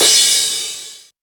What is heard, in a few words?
crash cymbal sound sample a sharp key, Royality free crash cymbal one shot tuned to the A# note.; cymbal drum samples